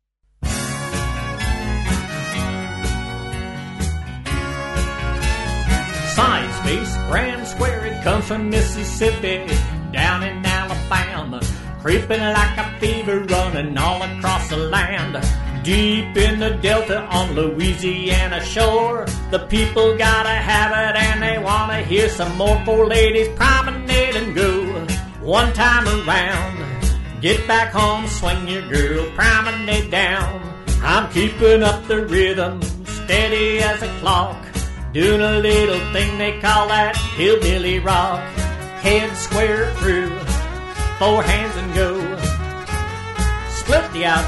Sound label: hillbilly rock vocal; square dance music